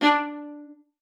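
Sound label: D5, viola spiccato; Xsample VOL-5; 90 s Sample CDs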